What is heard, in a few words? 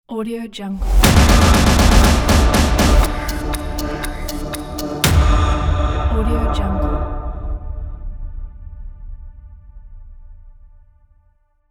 teaser logo